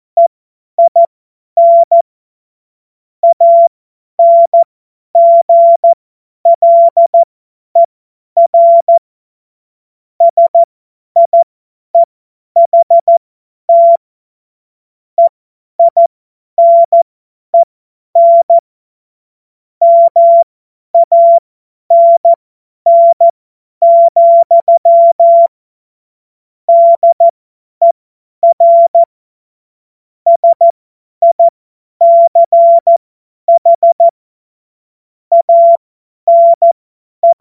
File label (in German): Witz, Morsekurs, 25 F70; Witze